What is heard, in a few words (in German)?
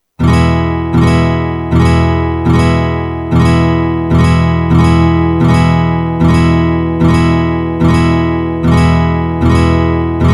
E Akkordfolge